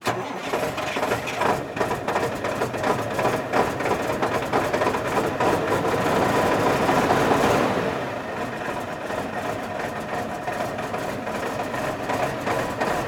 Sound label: Semi Truck; Blastwave